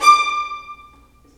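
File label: violins marcato, String Sections; Roland LCDP13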